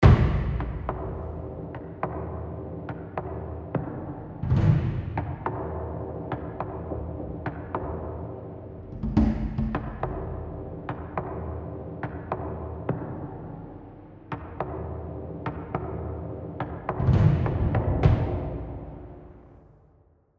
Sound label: Tension; AB Tension 4 High Block 6